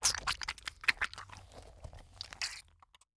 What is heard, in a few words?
"ooohhh"